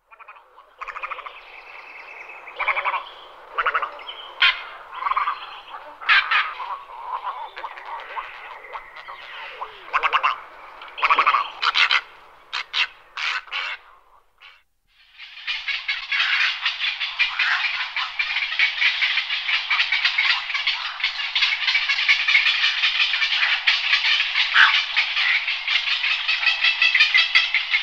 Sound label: Aigrette Garzette Little Egret Egretta Garzetta